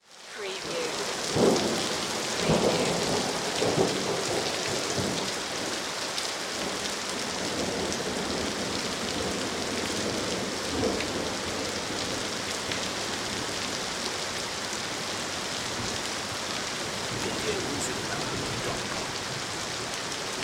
Rain Pour Thunder

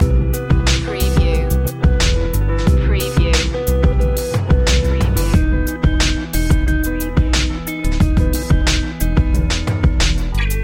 slow rock loop

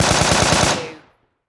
Machine Gun M4A2 Long Burst Close Perspective

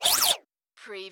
CARTOON ZAP UP DOWN

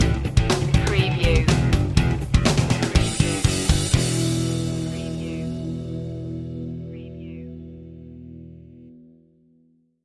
walk in style stinger

